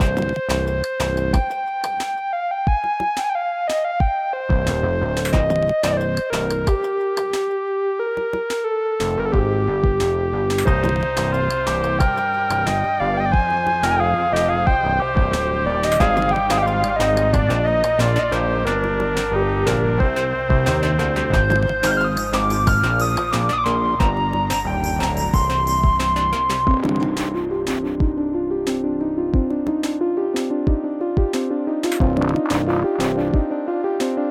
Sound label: Main Theme Loop